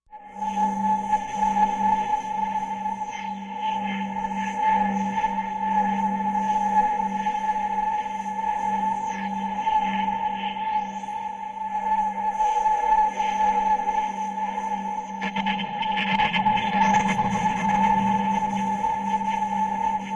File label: Cold Metall, metallic